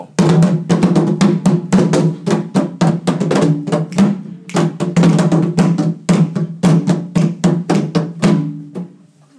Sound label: drumming compositions